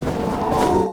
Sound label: RECRUIT WALK; BARAS; Mob